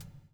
bubble; Spectrasonics - Backbeat

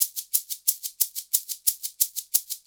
90 SHAKER

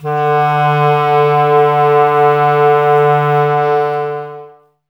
Clarinets, Wood Winds

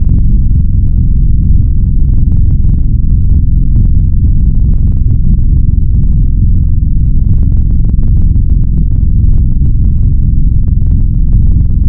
Spectral, wavetables; Architecture 32

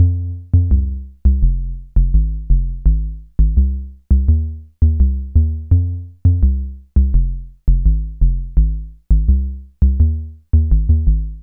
Drum & Bass; Best Service ProSamples vol.03 - Drum & Bass 1; AKAI

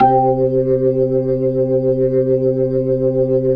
B-3, Jazz

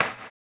HAMBONE; Blues & Soul

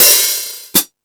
High Hat, Drum Machines

